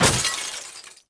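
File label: Vase Collapse; objects; Star Wars Obi-Wan; XBOX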